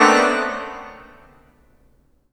Pipe Clusters; E-MU Producer Series Vol. 5 – 3-D Audio Collection; 3DSFX

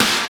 SNR BEND, Snares; Roland - Rhythm Section; Snares 5

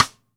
Ludwig Black Beauty Snare Cross Stick; Sonic Foundry; Sony Creative Software; Vital Drums; 1969; Snare One-Shots

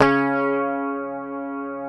PICKED, Bass, C#3; Sampleheads; Will Lee Bass Library

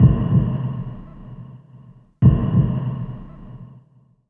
loop; X-Static Goldmine 3

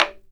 logdrum, percussion; ILIO; Synclavier Percussion 2; World & Orchestral

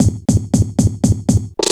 loop, Drum & Bass, 140 BPM; Ueberschall; Drum & Bass Resonance

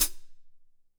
HAT CL; Q Up Arts; Denny Jaeger Private Collection

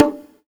Perc, retro house samples, Drum Hits

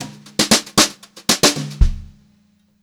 HOTROD FILL, Brushed Drum Loops; USB Soundscan vol.39; AKAI; 90 s Sample CDs; 116 HOTROD

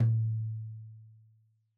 Tom2 Stereo, Deeply Sampled Drums

